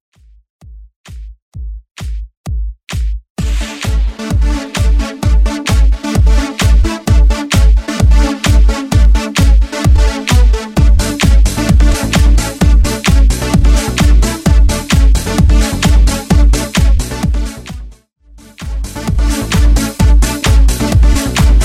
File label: Re Drum 130 BPM